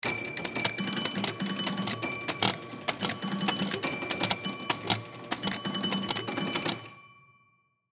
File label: Pinball Bumpers, Pinball Bumpers Hit Ball Multiple Dings High Pitched; Arcade; SPORTS; Blastwave